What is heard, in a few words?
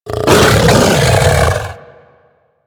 Feral Vocal Downed Tackle; Enemies; Kubrow; Warframe